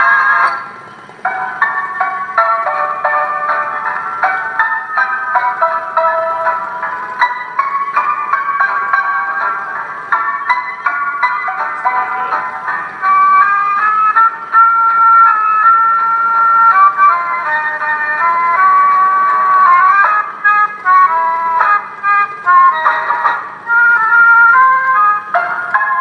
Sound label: gramophone in studio